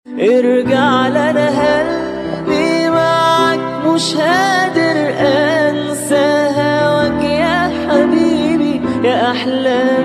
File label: piano; egypt